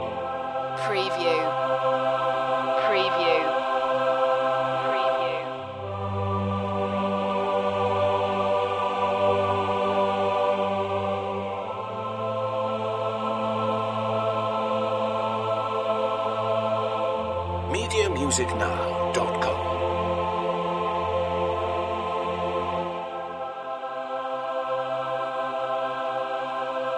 blissful choir loop end

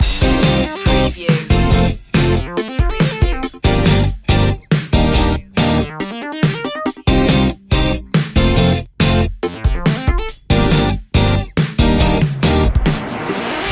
full city loop

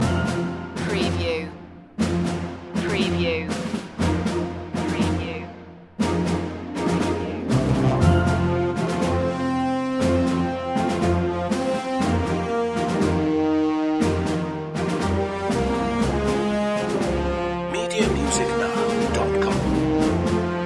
the long march home full length loop

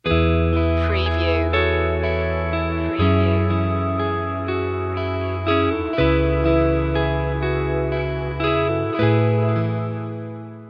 burning fire sting

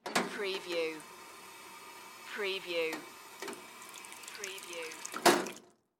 Drinking Fountain; Sound Effects Bible

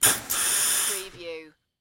Pneumatic Valve

